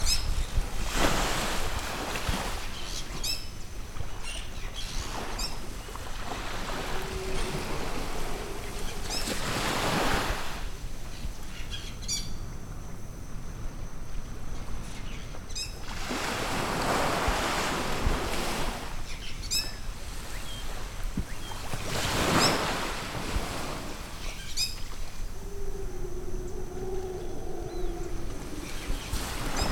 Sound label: Jungle At The Beach Costa Rica